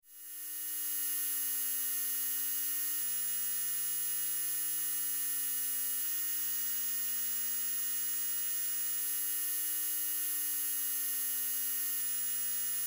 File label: Science Fiction Computer Screen High Pitch Static